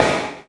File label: Snare; Vengeance Samples